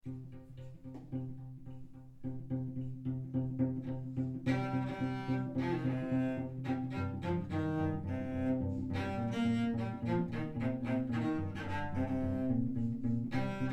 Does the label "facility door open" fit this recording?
No